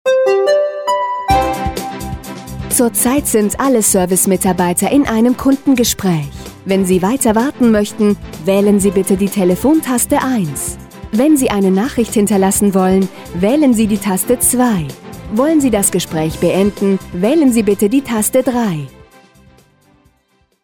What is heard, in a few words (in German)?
Geiger; Automatische Anruf Verteilung